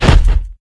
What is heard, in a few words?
dog footstep run; npc; source engine; episodic